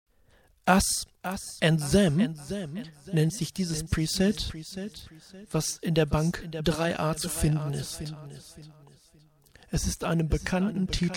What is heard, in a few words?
Preset Delay